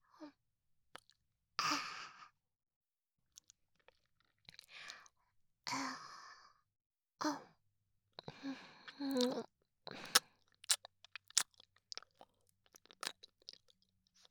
耳舐め, ASMR; SEあり

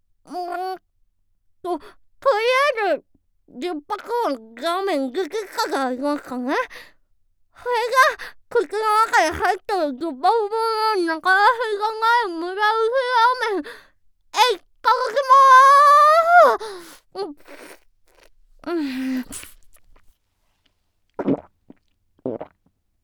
轟き響くザーメンゲップ！; ザーメンゲップ集

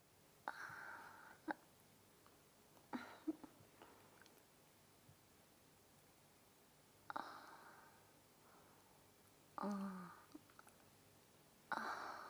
人妻がチンポをしゃぶりつくす! ガチのフェラチオボイス

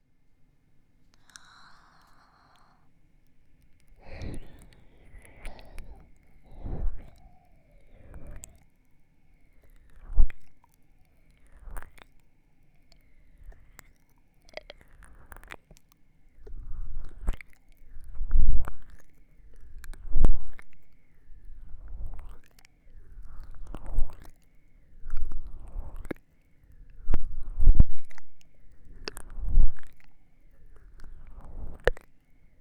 耳れろれろ弱（右）

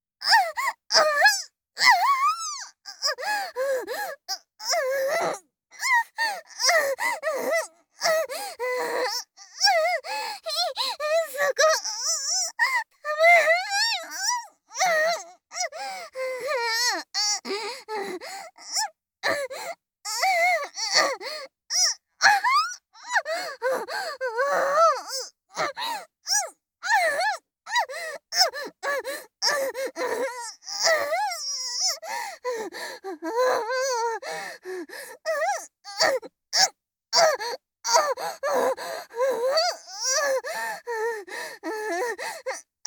オホ声; SEなし

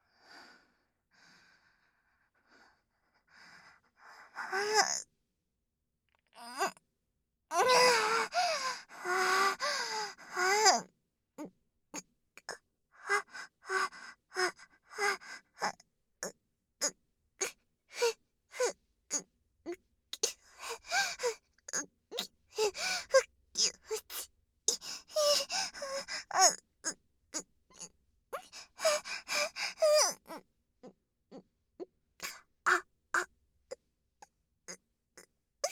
挿入 喘ぎ; SEなし

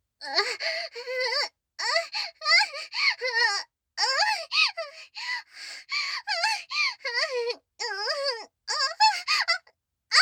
乱交喘ぎ

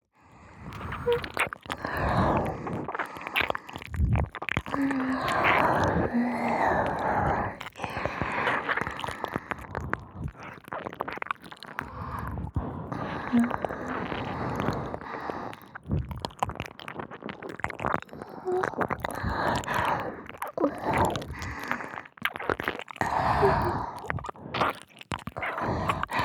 両耳舐めループ

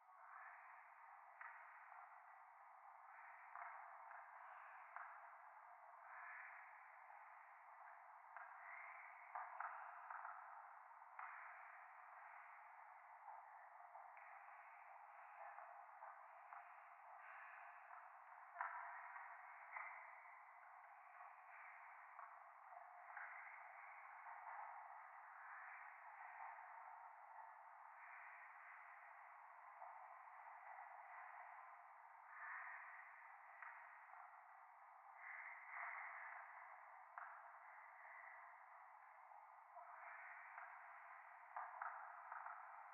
廃墟 右耳密着吐息 電車

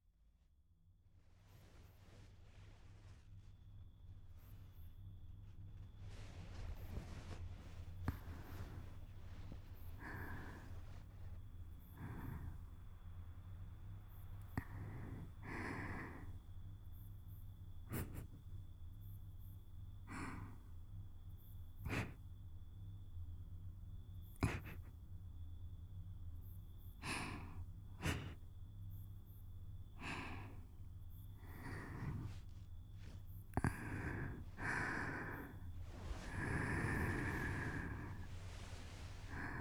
全身マッサージ、耳舐め, ASMR; 腰が抜けるほど気持ちイイ極上の夢精を迎えるために

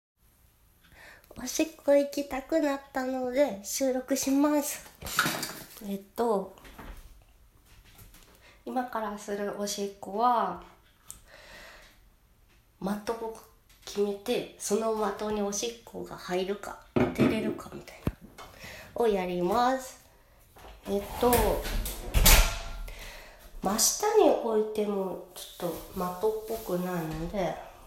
的に向けておしっこ

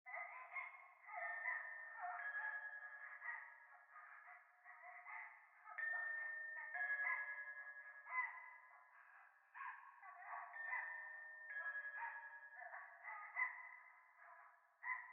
教会 喘ぎ 神社

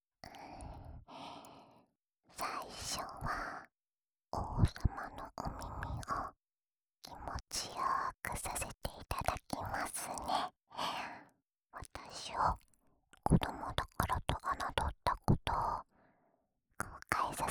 耳なめ 右耳; 踊り子ちゃん

